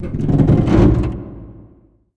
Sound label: lift creak; ambient; Half Life 2; Episode 2; outland